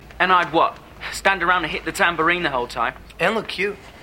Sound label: tambourine